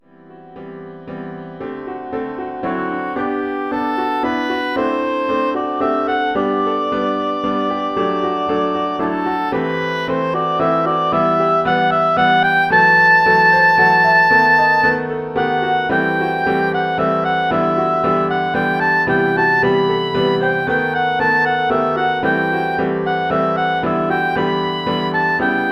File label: oboe solo + PA; oboe solo with piano accompaniment; This oboe solo has a slow waltz feel, but in compound duple rather than simple triple time.